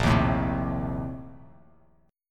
Bb Major 7th Suspended 2nd Suspended 4th, Bass Standard; strummed